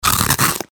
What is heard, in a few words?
eating chew crisp